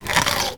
Peach Biting

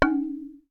Wood Spin

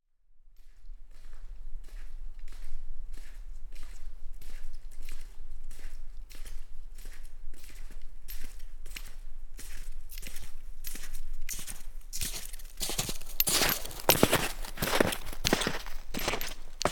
Snowshoe Walking

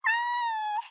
Cat Meow

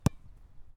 Soccer Kick

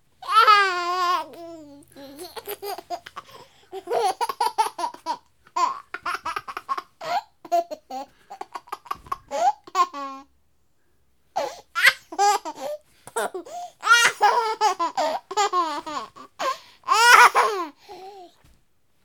Baby Boy Laughing

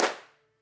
Sweet Claps

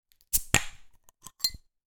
Open Bottle Cap

